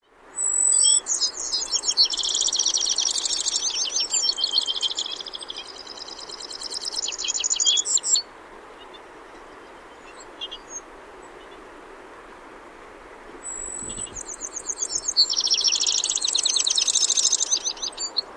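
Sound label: Corsican finch carduelis corsicana, birdsong; korsikanskiy vyurok; peniya ptits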